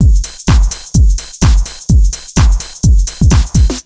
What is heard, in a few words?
127 BEAT, Fresh Disco House; USB Soundscan vol.20 - Fresh Disco House I